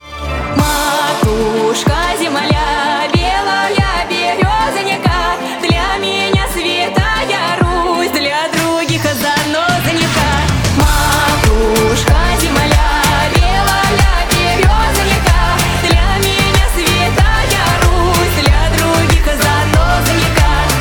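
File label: ringtone for phone call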